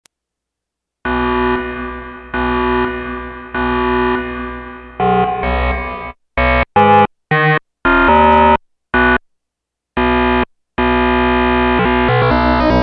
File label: SY99 base alarm